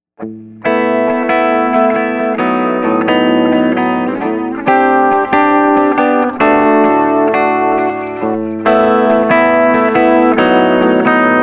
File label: Bridge FX clip; NoCaster